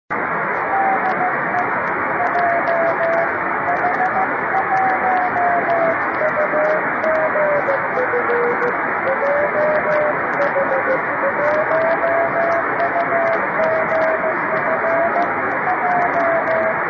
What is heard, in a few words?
24G beacon; 晴、気温２６℃ 湿度７５％; SSB:RST519; JH1UGF 横浜市; JN1AYV 茅ヶ崎市